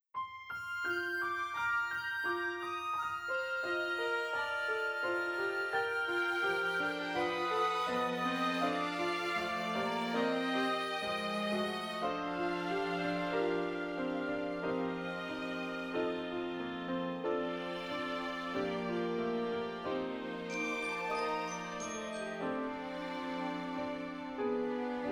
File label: Accompaniment Backing Tracks